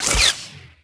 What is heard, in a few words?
saber hit body